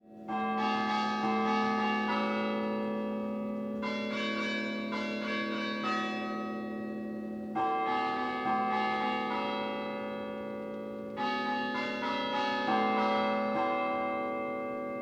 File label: bell